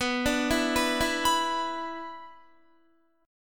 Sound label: B-Minor Double Flat 5th, Guitar; Bmbb5 arpeggiated; JGuitar